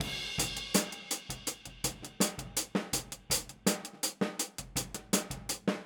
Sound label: Boogaloo, Hi-Hat, 82 BPM; Drums; Verse; Sonic Foundry; Drums from the Big Room The Mixes